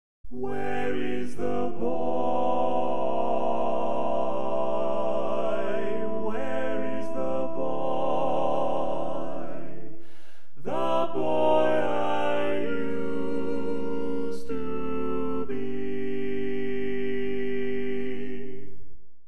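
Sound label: baritone, barbershop tags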